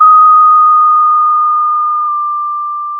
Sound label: pop, Sound of a school bell